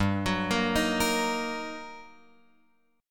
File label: G Minor Major 7th Sharp 5th G; GmM7#5; {3 X 4 3 4 6} arpeggiated